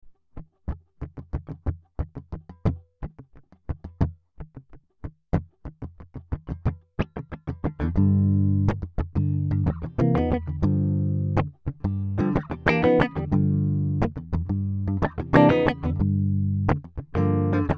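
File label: Gm guitar funky riff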